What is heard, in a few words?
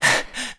Stamina Lower; Link running with lower stamina; Skyward Sword; zelda